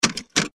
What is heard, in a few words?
Door Open; Rei Yumesaki